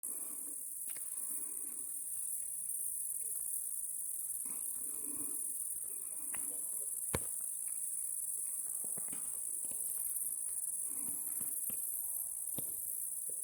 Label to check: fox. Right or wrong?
Right